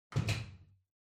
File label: Washer Front Load Far Door Close Strong